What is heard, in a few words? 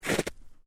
Footsteps Sneakers Shallow Snow Step Stereo, Walking in sneakers or runners in light snow.